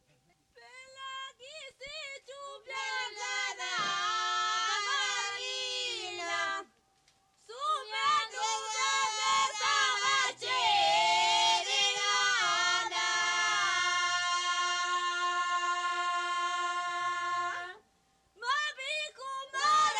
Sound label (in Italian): canto di mietitura; bella chi si cchju bianca da farina; Cardeto; Mammola; Cinquefrondi